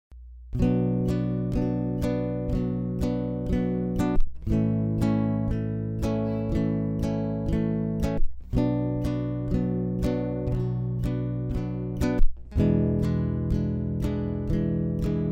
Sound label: rhythm, fingerstyle guitar; basic strumming; Bm, A, Bm, E7